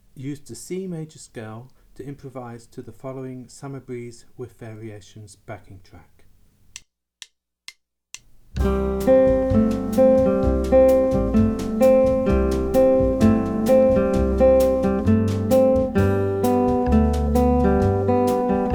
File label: Backing